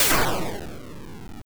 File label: Dash Release; Sonic WindowsZone